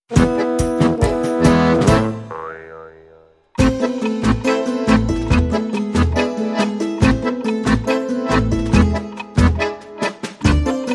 Ticking Tango

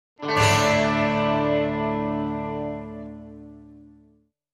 Hit

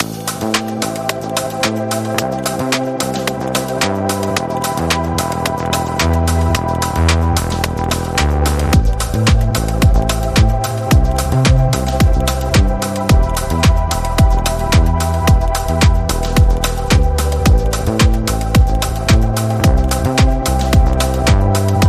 Alpine Ambience Rhythm

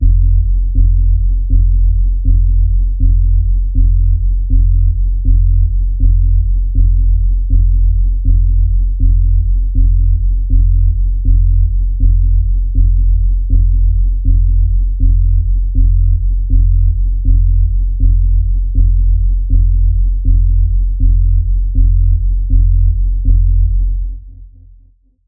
Threatening Doom Pulse F, Key of F

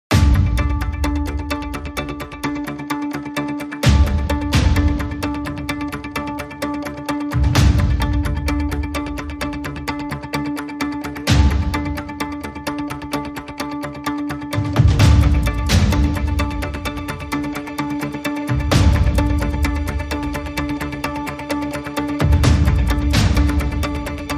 Clocked Strings

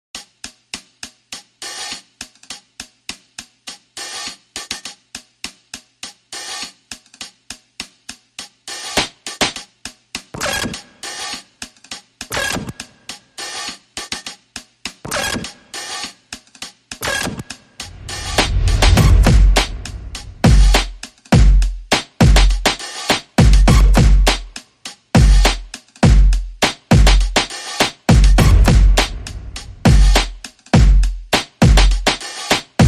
Drums and SFX Only

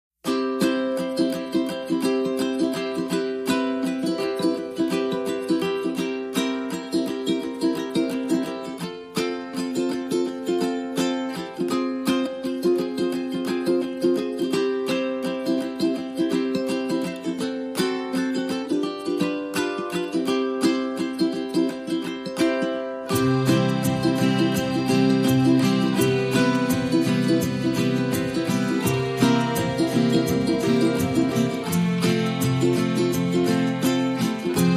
No Jingle Bells No Glock No Violas

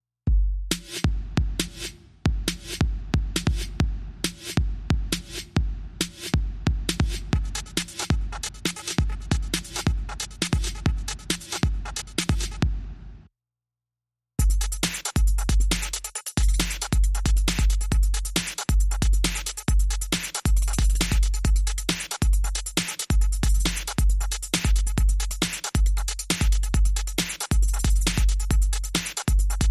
Electropunk, Beats

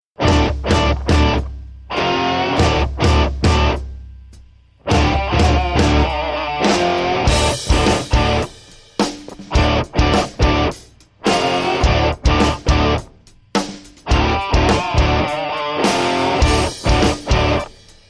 Rock Hammer

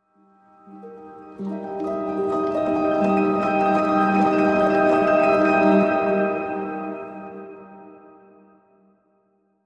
Twilight Keys Transition

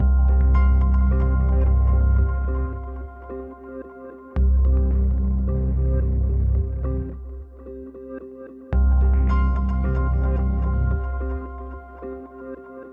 No Percussion

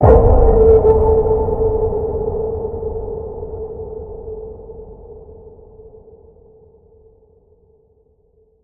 Deep Waters Ping, A

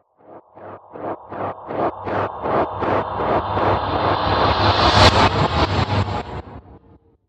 Glitch Speeding Up

